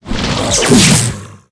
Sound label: mech ape archer attack, monster